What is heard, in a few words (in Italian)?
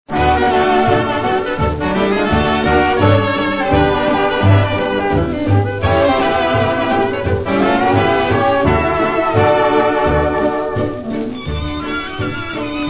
Orchestra Jazz Sinfonico